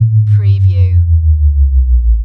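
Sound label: Production Element LFE Descend; Sound Effects Bible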